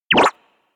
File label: self hug; awayuki; anemoi